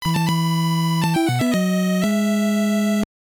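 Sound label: Jingle, Super Game Boy; America Oudan Ultra Quiz Part 4; GB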